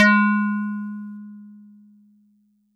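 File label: Metal Tube DX100 G sharp 1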